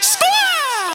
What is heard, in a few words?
8bit